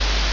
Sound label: ambience room tone